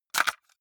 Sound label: cassette case insert hard; objekte; kassette